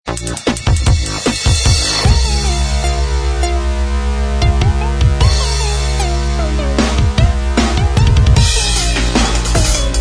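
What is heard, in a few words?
dubstep remix instrumental track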